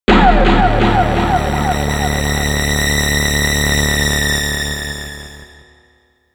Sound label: Shock; Clock Tower 20th Anniversary Sound Collection